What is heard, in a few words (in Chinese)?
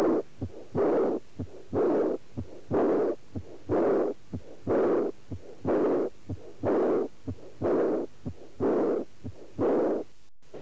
主动脉瓣关闭不全